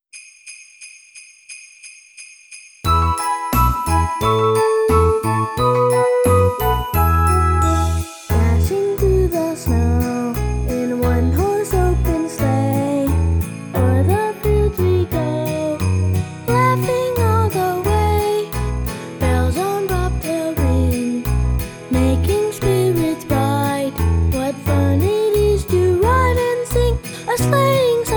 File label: Jingle Bells